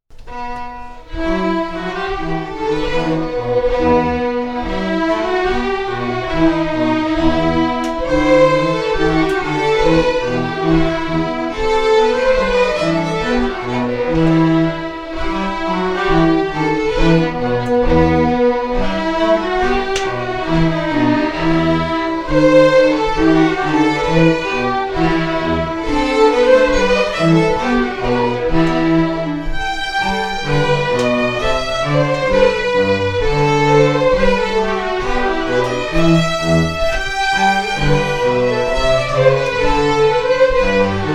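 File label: Strathspey, Scottish Fiddling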